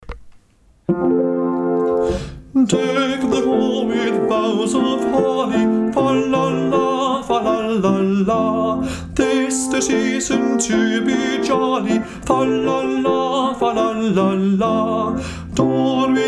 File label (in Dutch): Deck the hall tenor